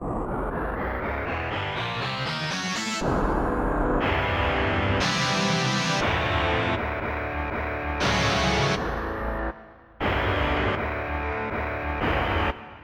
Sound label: Power Guitar 2; Onj's Soundfont